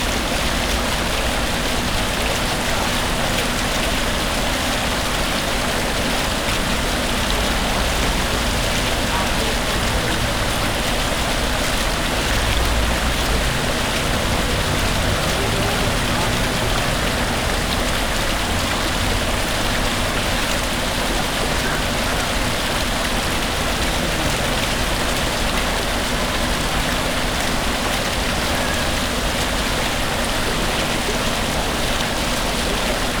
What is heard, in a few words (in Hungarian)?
hid arnyekaban vizben gazdag vizespark